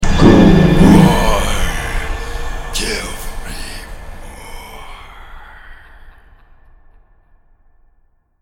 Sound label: Zombie win; cstrike